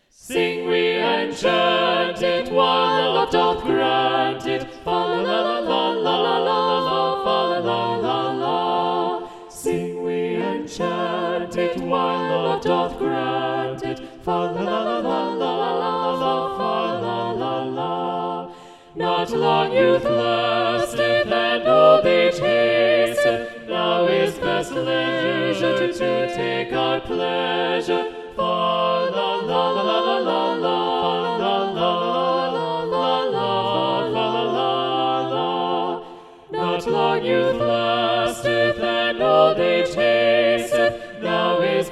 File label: SATB Balanced Voices; Sing We and Chant It; Thomas Morley arr Russell Robinson